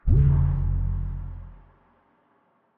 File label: Jump No Arm Whoosh; Rhythm Hermit; One Hand Clapping; PS4, PS5, Stadia, Switch, Windows, Xbox One, Xbox Series X/S